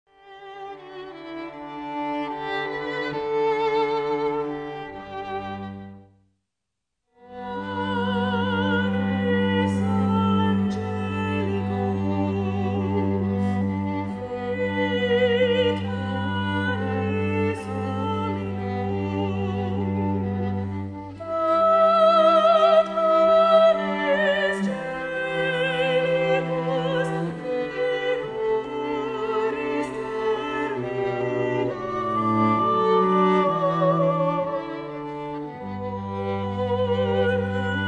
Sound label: Panis Angelicus Soprano and String Duo; Frank